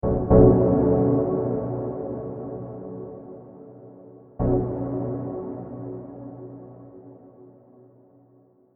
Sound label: Chord Motif A maj 110